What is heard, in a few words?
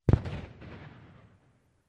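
from the car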